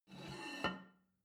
plate take table slide, gastronomie; soundpool

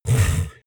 monster snuffle big; kreaturen

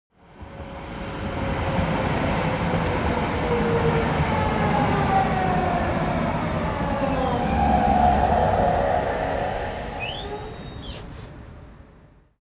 train subway old arrive; bahnverkehr u bahn

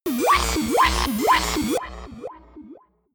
shot futuristic wobble aggressive; digital; soundpool

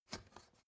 step ladder wood scrape single; schritte

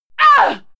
female fight attack hit struggle; schreie; menschen

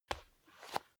step barefoot wood parquet single right; schritte

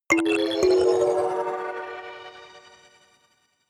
notification kalimba magical second up

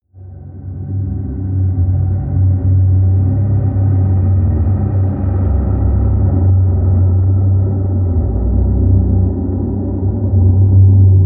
drone noise

